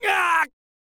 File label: swordman damage